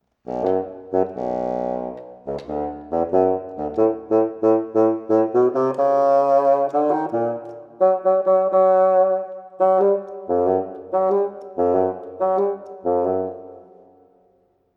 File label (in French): basson; pierre et le loup